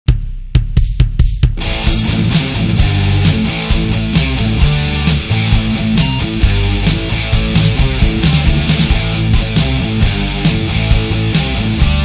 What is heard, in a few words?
ROCK ROCK